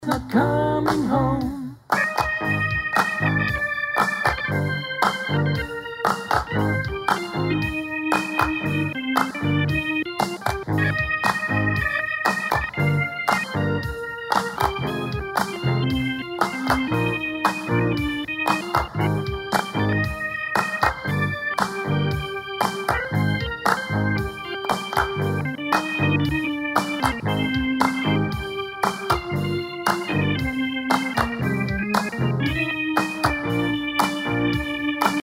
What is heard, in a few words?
prayer organ